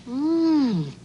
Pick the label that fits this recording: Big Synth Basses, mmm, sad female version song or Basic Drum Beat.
mmm